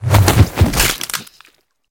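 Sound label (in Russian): размашистый точный удар ногой по лицу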